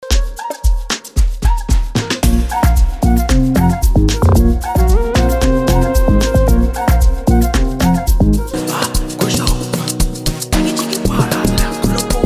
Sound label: Amapiano; Amapiano Banga